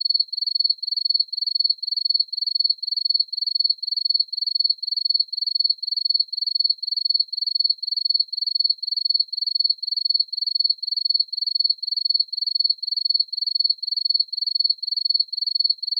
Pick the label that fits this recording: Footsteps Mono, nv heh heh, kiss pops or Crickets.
Crickets